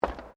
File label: FOOTSTEPS WOMENS LOW HEELS ESCALATOR STEP; Footstep Foley; Human